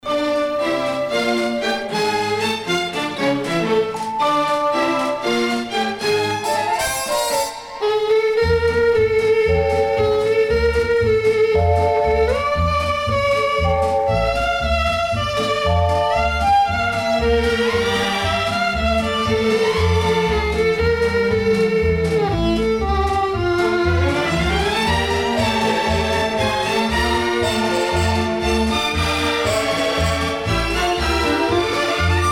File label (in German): orchester, violine, foxtrott; Schwarze Augen; jazz